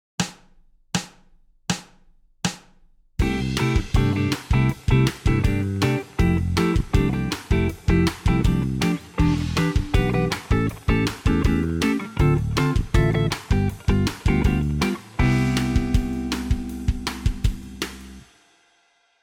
jazz backing; 小指の運指を活かした譜例; ロック; ヤング・ギター８月号

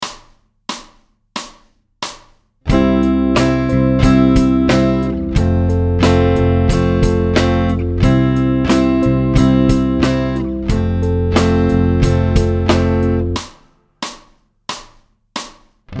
chord

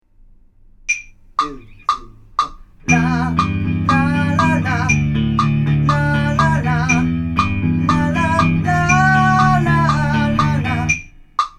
vocal

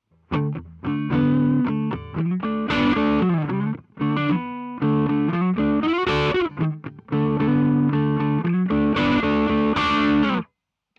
Clean Front; P-Rails

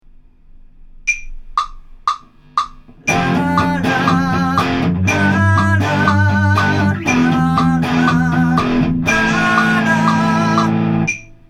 vocal

